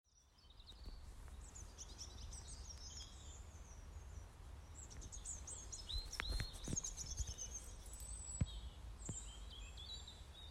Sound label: Birdsong in Dolgellau, calming sounds